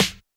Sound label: Snare, Roland TD-20